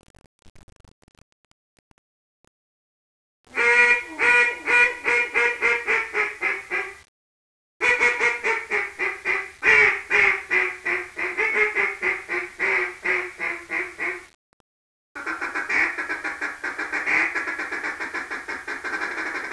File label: timber